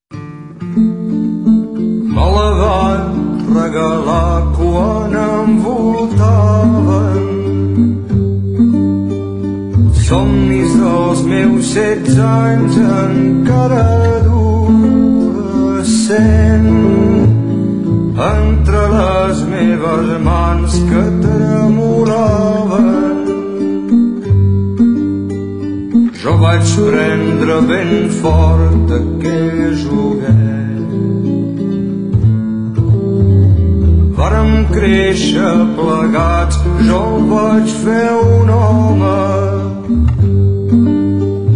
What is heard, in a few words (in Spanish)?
una guitarra